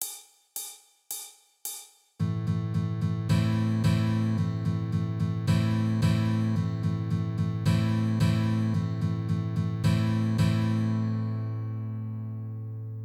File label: In Focus Ex3b Normal